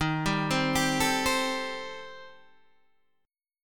D sharp 6th Flat 5th, D sharp, down, Guitar, DADGAD